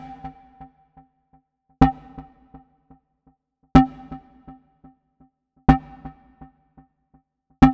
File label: Synth 124bpm C